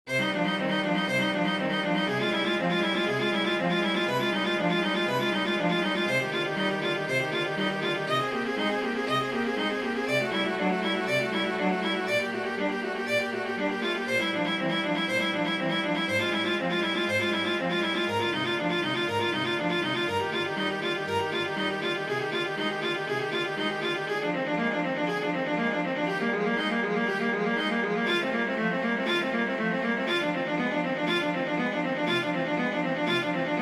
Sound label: praludium minor for viola cello; Johann Sebastian Bach; bwv 847